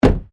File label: WRECK CAR SMALL DOOR CLOSE; Wrecked Cars, impacts, crashes, scrapes; Objects